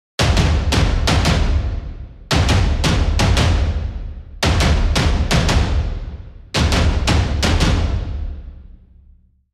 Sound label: Drums, 170; Terminator